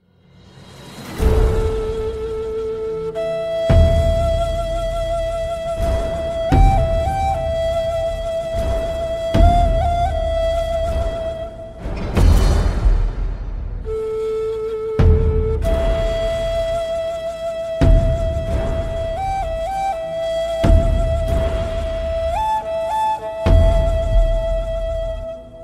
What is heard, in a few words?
Aci Ney